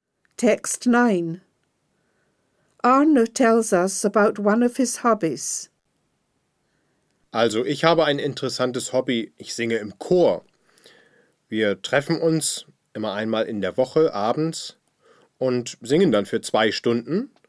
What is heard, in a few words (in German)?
singen im chor